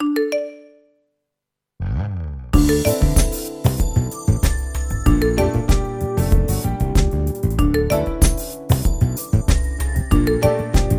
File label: here s an all too common sound hopefully redone in a cool way tritone